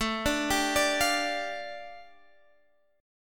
A 7th Suspended 4th Sharp 5th A Guitar Open G, chord